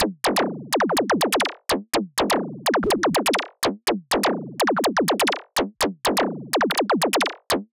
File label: Lazer Beat 124 BPM, loops